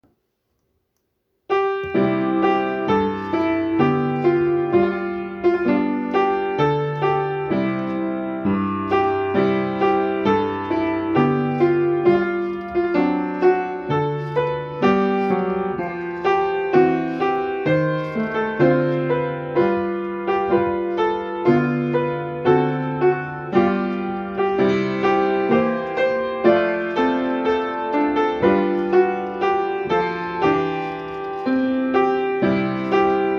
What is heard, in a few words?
A Holy Day of Rest, piano